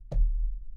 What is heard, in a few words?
Slam